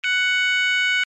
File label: alarm tone, sounder alarm tone; sounder beacon